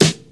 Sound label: rap steel snare drum sound E key, steel snare drum tuned to the E note; 90s